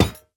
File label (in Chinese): 宝库放置声